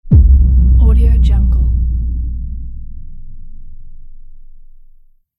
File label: sub deep impact